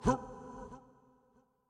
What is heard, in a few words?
Toxic Mask Small Effort